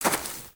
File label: Footstep; Lego; Strombie; creatures organic